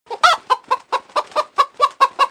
chicken; chicken soundboard

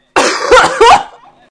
cough

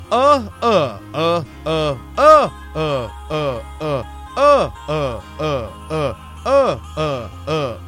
ah ah ha ah ah

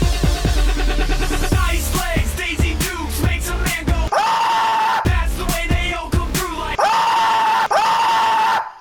aaaaauuuuuuhhhhhhhhh; Makes A Man Go AAUUUUUUGH!!!

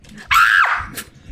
scream

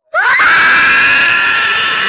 scream loud

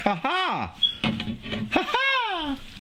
Angry Cop Laugh

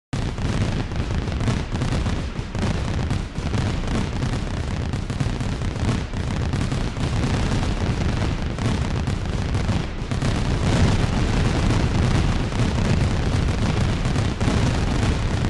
Firework With Long Burst

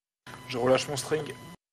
string

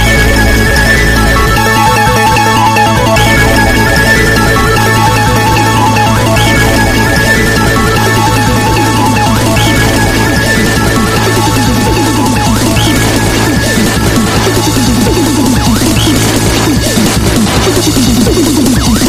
crazy music; staggering beauty

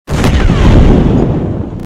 Explosive Punch Sound Effect; Explosive Punch Meme Sound Effect

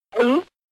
gulp sound; disney

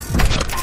mech footstep; Transformers Footstep

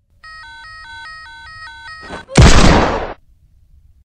aa gun sound effect; rogue lineage

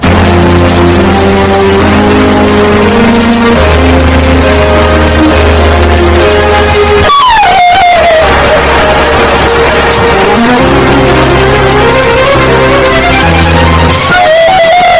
relaxing flute music

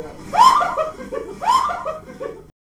laugh or scream

